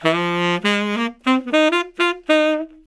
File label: SAX LICK, BRASS LICKS; Ueberschall; Jam Box